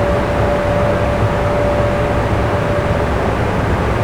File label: Sr1 run; finnish